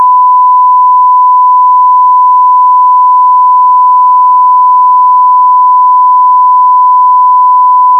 a short bleep used to censor language

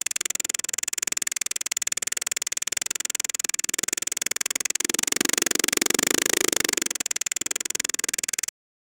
cartoon character spinning like a tornado

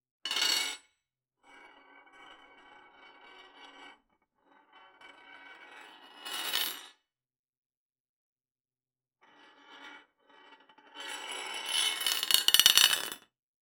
many metallic objects

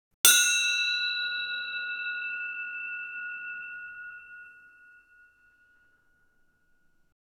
distorted and aggressive

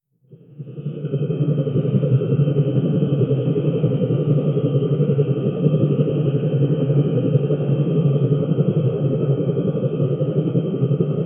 sci-fo ambience

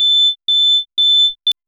three quick beeps little

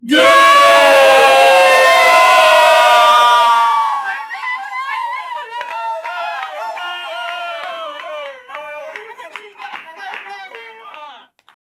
a celebratory yay after a big win

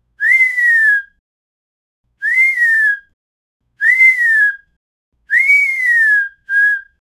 a short sharp whistle signaling attention

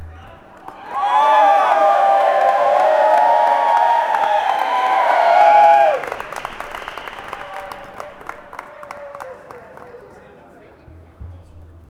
people cheering in an anc

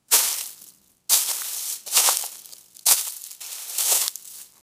stepping on leaves

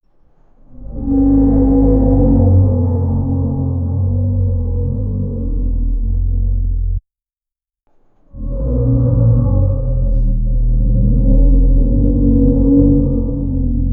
horror ambience

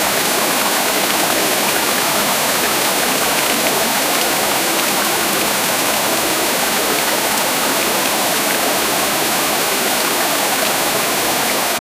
gushing waterfall cascading into a pool

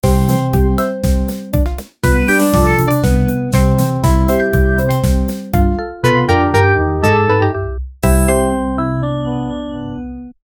level complete awesome in

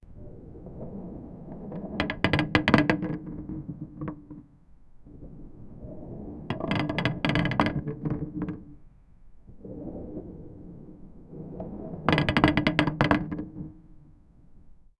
crawling in vent

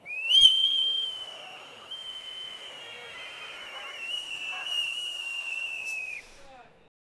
referee blowing a sharp whistle during a game

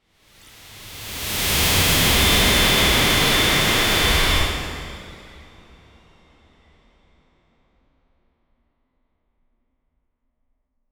digital whoosh fast cinem

